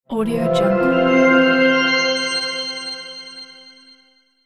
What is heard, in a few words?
heavenly gate reveal